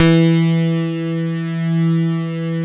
PERC BAS

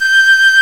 fife, British fife; Roland L-CDX-03 Disk 1

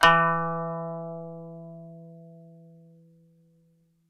kanun E3; SoundBytes; Early Patches; KAN SING BIG

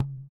THROAT; Spectrasonics; Metamorphosis; THROATERS

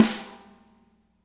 MED SNR, natural kit; Spectrasonics; Burning Grooves CD2

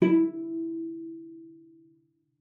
cello pizz E4; 90 s Sample CDs; Xsample VOL-5

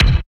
BD, BD Dance

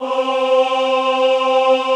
AHH C3, Classical Choir; Best Service; Extended Classical Choir

